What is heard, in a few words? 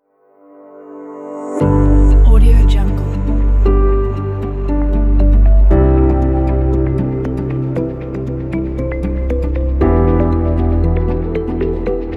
minimal inspiring ambient; mainstreampro